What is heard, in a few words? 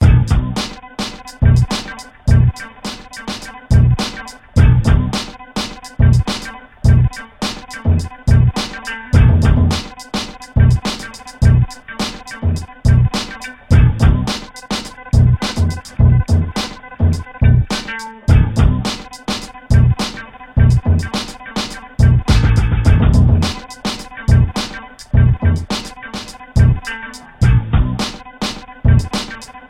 beat